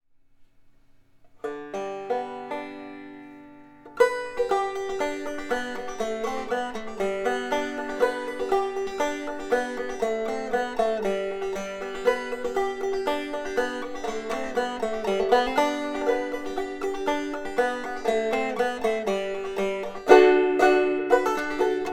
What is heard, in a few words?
Bright treble notes. A little lacking in the midtones. Good note separation., banjo; Not perfect, but still a good rim; Recordings: M-Audio microphone and Fostex MR16 recording studio; Flop Eared Mule